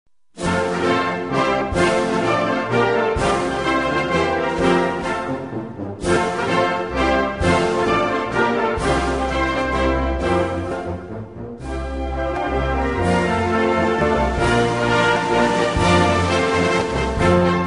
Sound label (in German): hymne polen; Europa